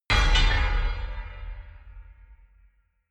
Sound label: metal horror clang, metall; industrie wirtschaft